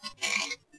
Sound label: Short krik-like call of sick adult, loris